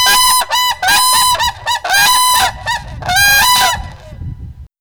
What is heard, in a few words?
whooping crane unison call